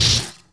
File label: ls block, weapons